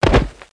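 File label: body hit; Total Chaos Nukem 3d Pack; duke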